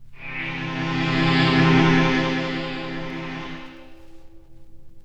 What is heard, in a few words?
Prehistoric Synth C3; radiosonics